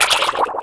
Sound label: drown; alienarena; xeno